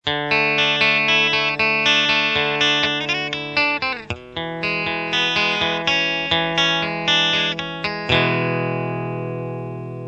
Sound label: bridge, guitar